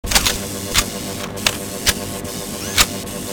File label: stun rifle reload; weapons